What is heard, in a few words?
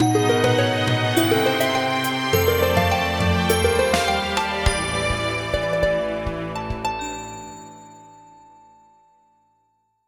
fanfare; Open source MIDI music by Fredrik Johansson